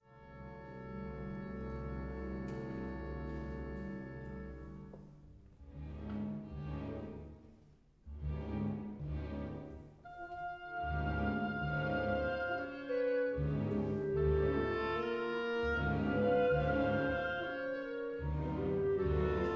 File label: orchestre symphonique